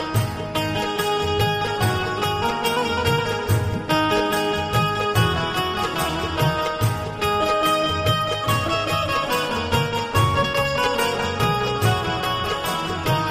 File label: instrumental ringtone